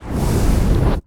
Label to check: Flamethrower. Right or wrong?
Right